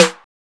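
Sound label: snare drum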